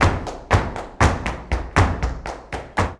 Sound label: BULERIAS TAP, Flamenco